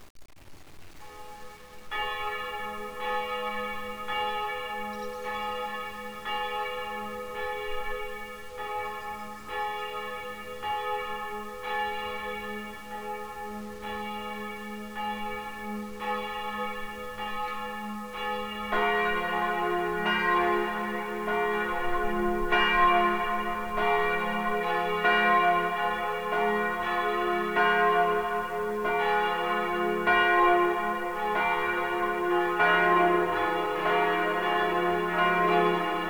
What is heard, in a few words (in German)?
Volles Gelaeut Ostern, glockenklang; Jesuitenkirche Innsbruck